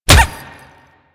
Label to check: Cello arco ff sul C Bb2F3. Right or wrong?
Wrong